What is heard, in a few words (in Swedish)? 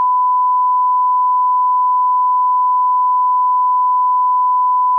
Sinus